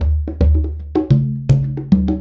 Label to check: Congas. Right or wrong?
Right